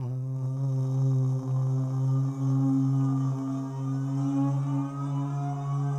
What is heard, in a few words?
VOICEFX ETHNO 160